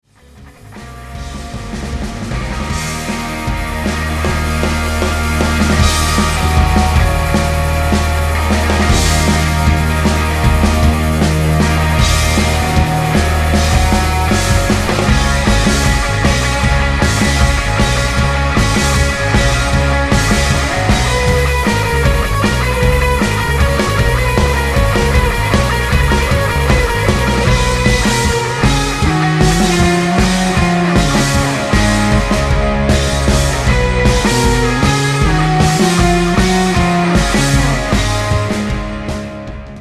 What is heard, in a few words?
ac30